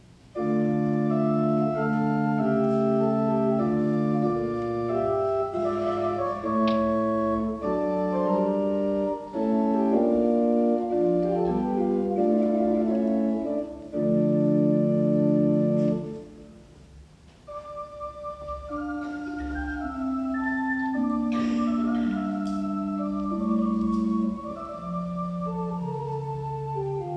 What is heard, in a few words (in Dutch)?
fluiten, orgel; assen